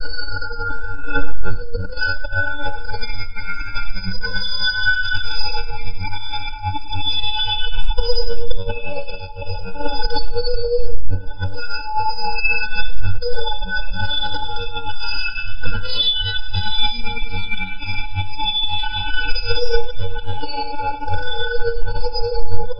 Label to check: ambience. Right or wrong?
Right